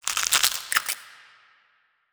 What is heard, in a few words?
small legs up down; biogenesis